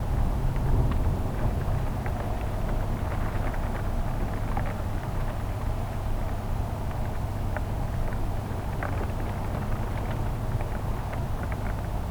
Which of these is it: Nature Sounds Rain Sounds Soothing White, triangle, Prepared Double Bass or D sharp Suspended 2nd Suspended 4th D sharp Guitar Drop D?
Nature Sounds Rain Sounds Soothing White